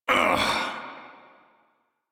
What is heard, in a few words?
Pain Male; en; Warframe; Railjack Crew; Arbiters Hexis